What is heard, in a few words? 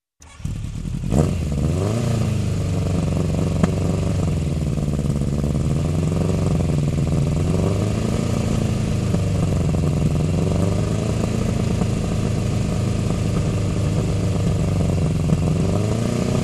Motorcycle engine running and revving up